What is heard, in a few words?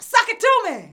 VOX VOX; Sound & Vision - Gigapack II CD 2